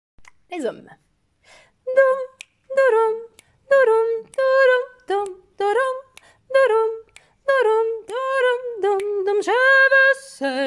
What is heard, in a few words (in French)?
hom